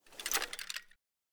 m249 holster, weapons

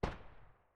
ump45 silencer mono far

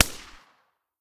silencer p99 mono far, weapons

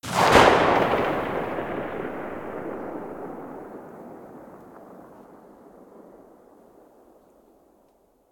mosin muzzle mono far, weapons

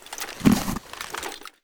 mask use; STALKER; IWP; item usage

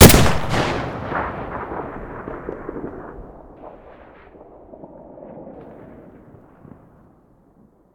awm muzzle stereo, weapons

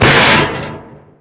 metal crash; STALKER; IWP

